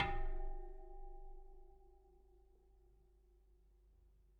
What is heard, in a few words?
Bicycle Spoke